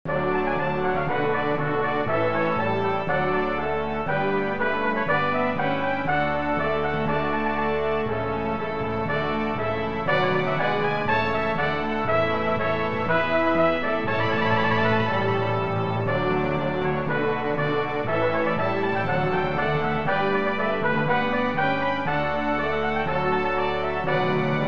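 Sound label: Hymn Brass piccolo; Hymn 362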